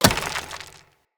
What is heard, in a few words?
Hunter Bow Arrow Impact Rock; Weapon Impacts; Player; Bows; Environment; Envoy Hunter Bow